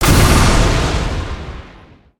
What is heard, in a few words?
Lightning Strike; Shinobi